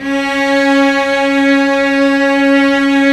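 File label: cellos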